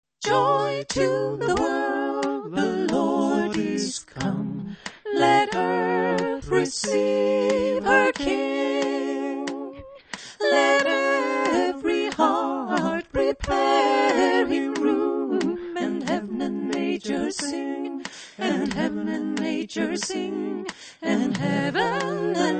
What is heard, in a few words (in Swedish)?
Joy sopran